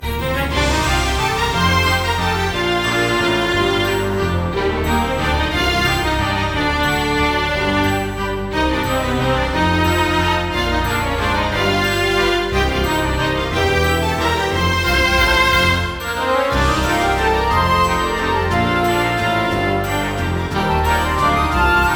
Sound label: Blessed Waltz